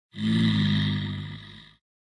alien Captured; fraction feed the frog

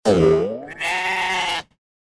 sheep bounce; sentence tumblers

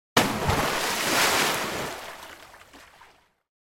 splash; spider drop spelling game

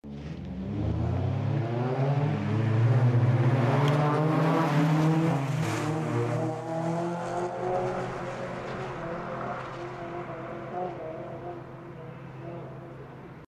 passing Ambient; racers sentence arranger

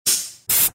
compressed air; spider drop spelling game

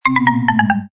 points Zero; fraction feed the frog

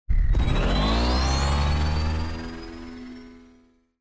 boost; racers sentence arranger

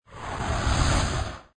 jetpack Land; robot collector

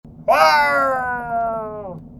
pirate fall; spider drop spelling game

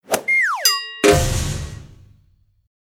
robot fall; spider drop spelling game

